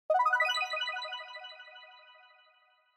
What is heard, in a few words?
Multi Accent Long High